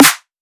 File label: snare small